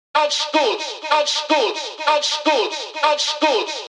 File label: Vox; Unit Rcrds